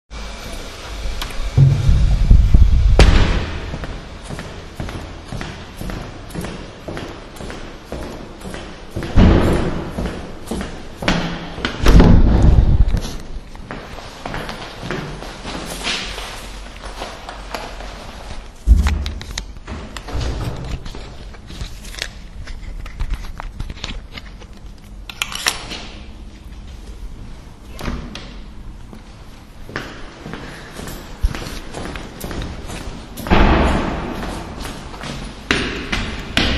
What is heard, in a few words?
walking up the stairs to my office